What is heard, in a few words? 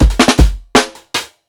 PICCOLO FILL, breaks, drums; Basement drums vol 2